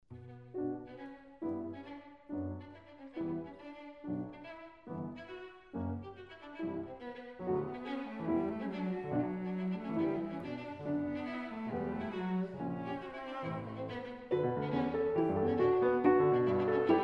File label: trio